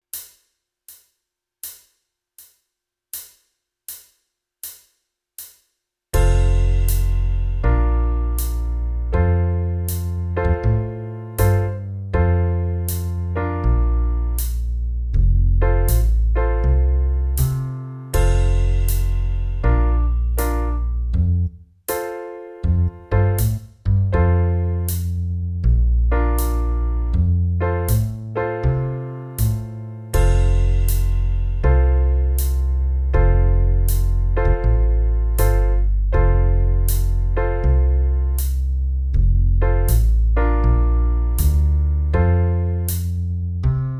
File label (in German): backe backe kuchen band, Triola